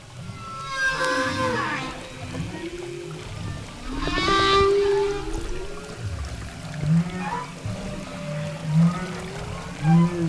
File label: Humpback